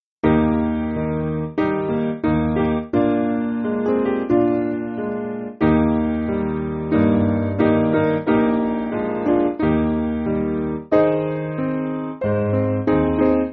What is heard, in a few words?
He Is Most Dear Piano; Weber